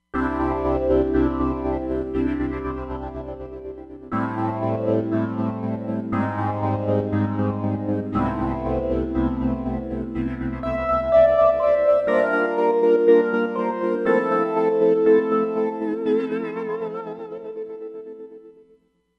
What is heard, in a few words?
Pad Pulses, Roland Fantom XR